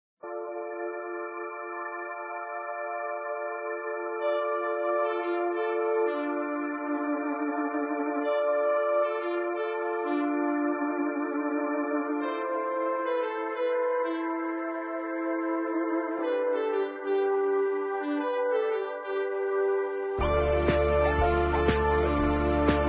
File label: Keytar; Zomboid; Lifestyle